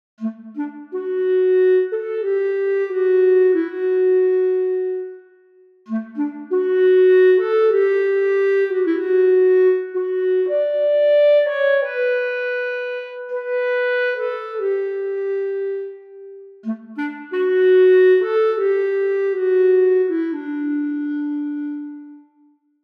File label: Clarinet Legato